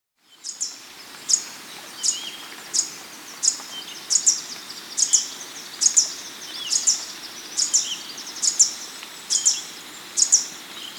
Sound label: Green Violetear (Colibri Thalassinus), Andean Birds; S. America